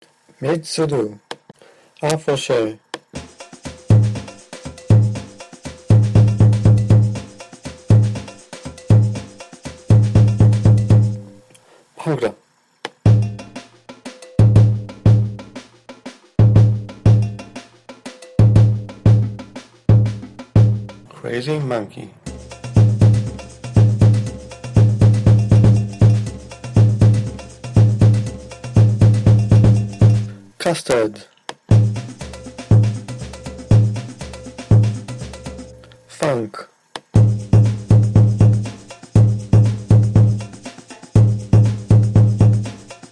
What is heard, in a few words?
Mid Surdo